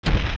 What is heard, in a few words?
Enemy Bounce